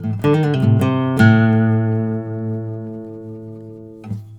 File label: BARI LICK, Blues Guitar; ILIO; Fretworks; 108 BARI LICK